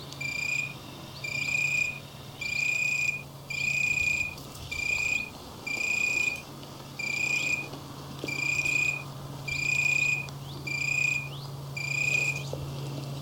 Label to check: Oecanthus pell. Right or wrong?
Right